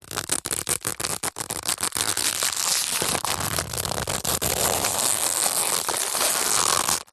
foley plastic stiff packet small handling squeezing